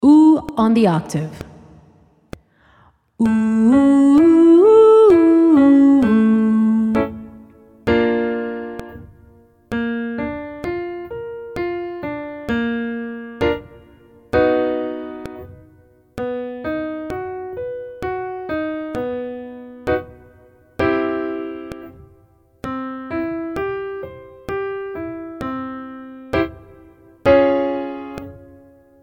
oo octave high range, vocal exercises

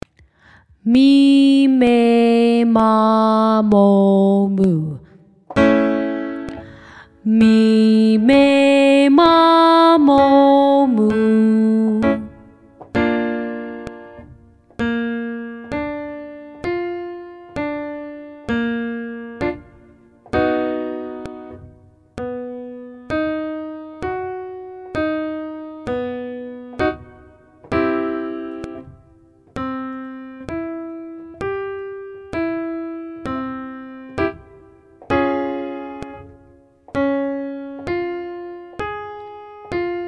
me may 5 high range, vocal exercises

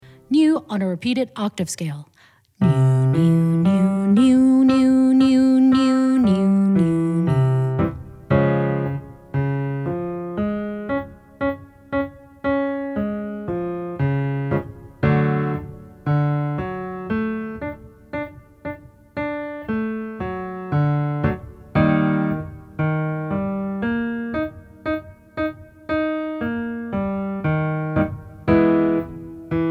nu repeated octaves low range, vocal exercises

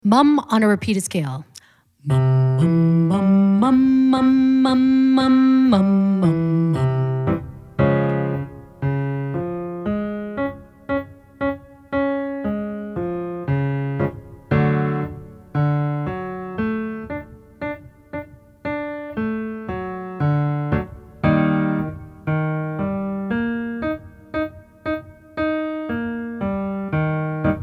mum repeated octaves low range, vocal exercises

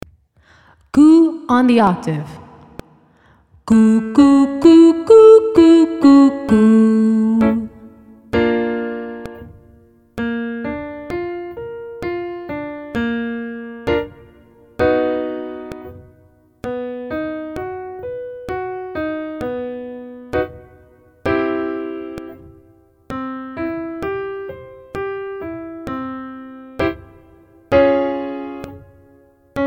goo octave high range, vocal exercises

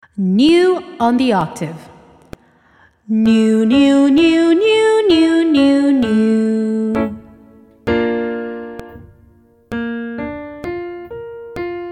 nu octave high range, vocal exercises

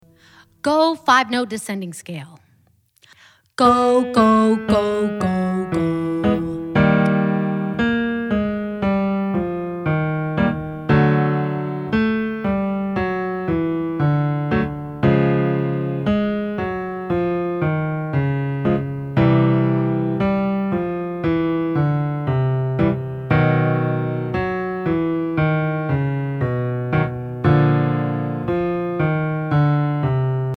go 5 des middle range, vocal exercises

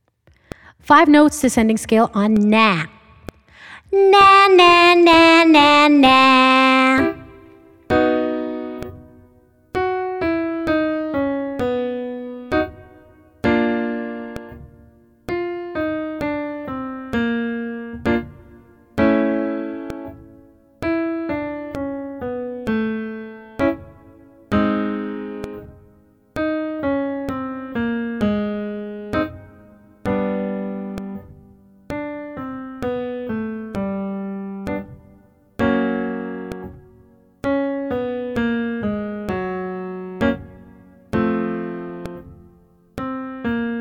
nah 5 high range, vocal exercises

